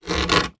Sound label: wood scratch; zapsplat